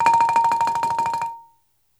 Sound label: BALAFON